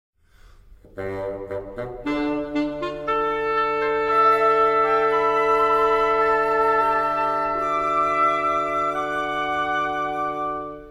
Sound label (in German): Holz; Schickt mich die Mutter